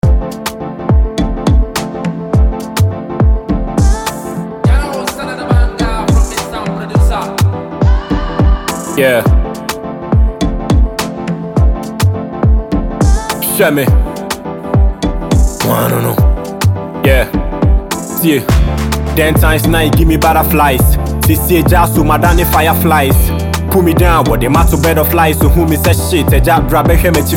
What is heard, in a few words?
Rap Freestyle